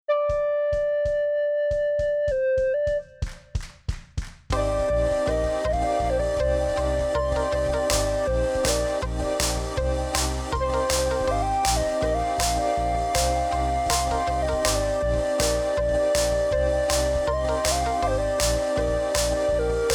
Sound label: eerie beautiful